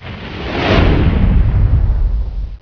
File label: Bridge Warp; Star Trek JR Audio